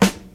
dope snare single hit g key, Royality free snare drum sample tuned to the G note; snare drum samples